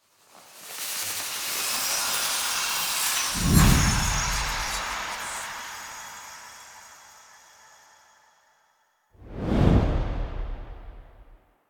Quest Card Appear; Warframe; Jade Shadows; Quests

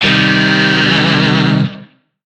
Shawzin Blues Chord A; Warframe; Lizzie Shawzin

